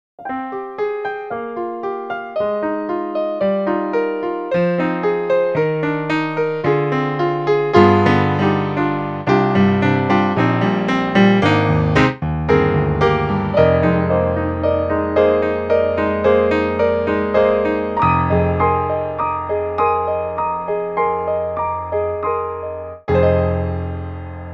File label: Fazioli Grand Piano; SoundFont2